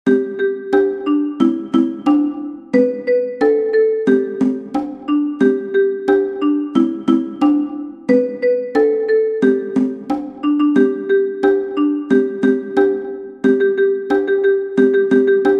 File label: Cajon